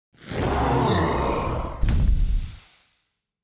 Demon Minion Death; TANK; 3Souls

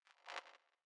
zap

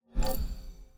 mob spawn

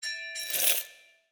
ui salary

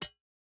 environment pickaxe hit iron

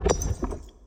environment room activation

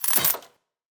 forge armor buy; Shelter

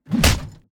blacksmith strike

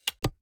click card close

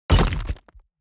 environment room damage stone

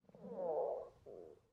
ui low food

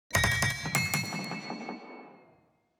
building upgrade stinger

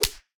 mob spanking hard

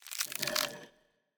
undead ready; Shelter

